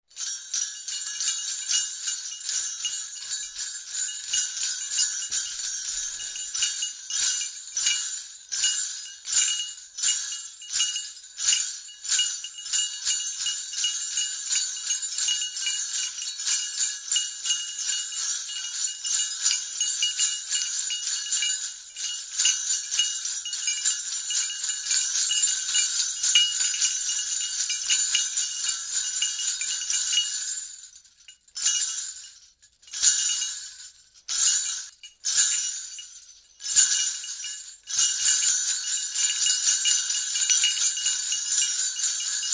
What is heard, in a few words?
Sleigh Bells, Various jingling moves; Musical; Tom Hutchings